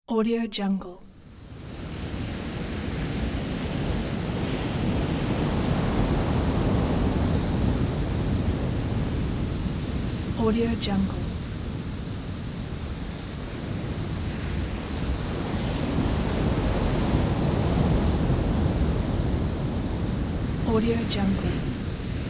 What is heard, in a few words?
distant ocean waves